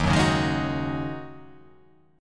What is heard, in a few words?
D sharp Minor 11th D sharp, chord